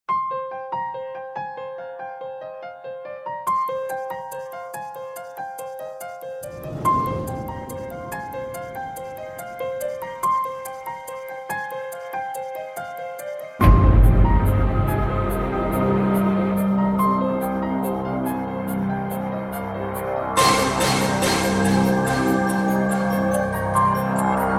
Mysterious Piano

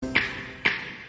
snaps

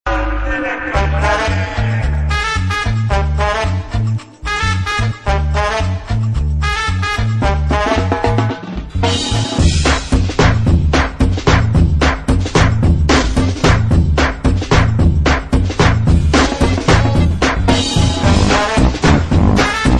Bass

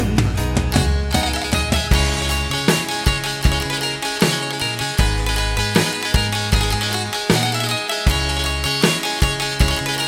Albanian Cifteli

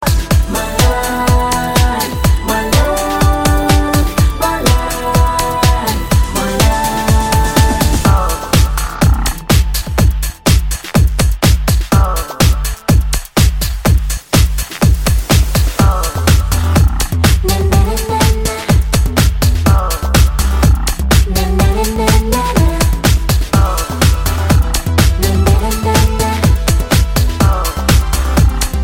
Chill Out Bg Bit

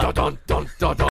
Duh Duh Duh

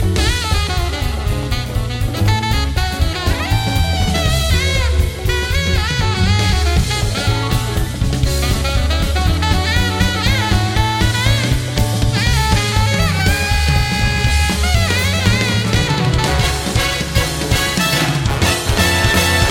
Jazz

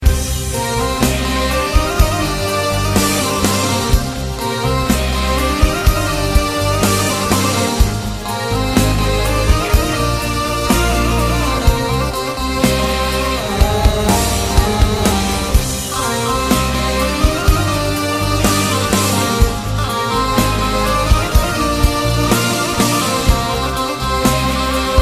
Greek oriental

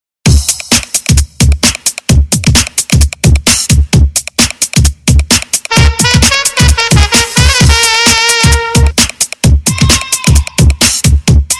Slow Mix; Ipl 5

